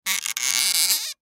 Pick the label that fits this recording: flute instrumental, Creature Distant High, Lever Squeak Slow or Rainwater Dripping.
Lever Squeak Slow